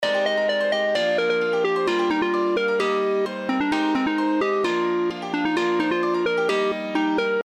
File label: piano